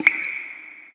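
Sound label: DRIPWAT, drip water; forsaken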